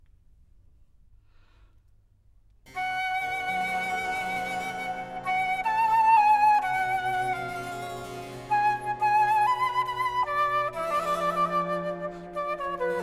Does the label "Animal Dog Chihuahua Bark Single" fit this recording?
No